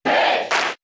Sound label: Cheer English; Pit; SSBB